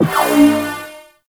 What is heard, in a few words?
Shot Layer, pistol; Warframe; Corpus; Blastor Pistol; BE Plinx